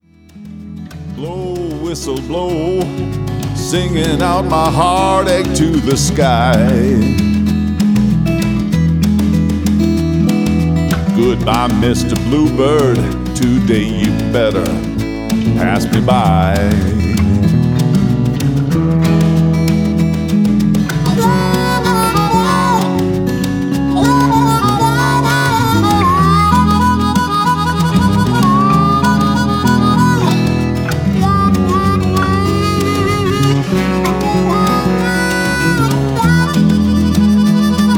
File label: Scratching, Train Station Blues